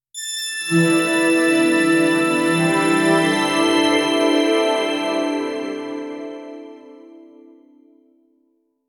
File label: violin, glimmering